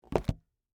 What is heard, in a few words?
Foley, Bible, Set, Down, Book; Books (Sound Effects:Offices)